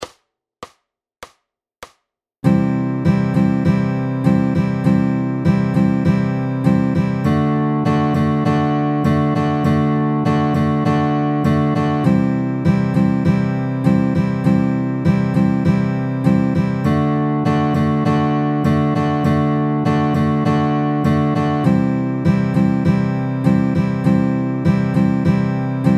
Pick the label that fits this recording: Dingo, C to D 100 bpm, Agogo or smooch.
C to D 100 bpm